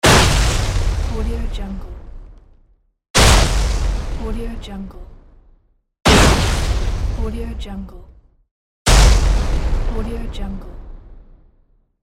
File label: tnt explosion